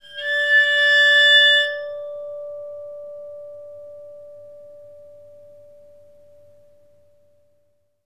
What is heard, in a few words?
WATERPHONE E; ILIO; Synclavier Percussion 2; World & Orchestral